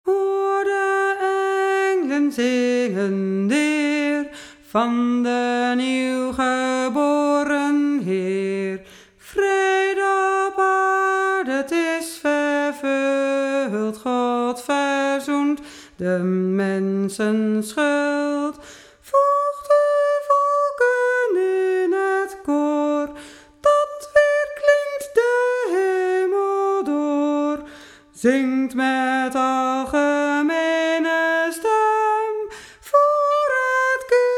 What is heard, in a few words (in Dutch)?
Hoor De Englen BAS zang